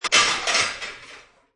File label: Single plate stack sound; Kitchen; Household